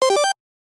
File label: Exec Alert, Business Rings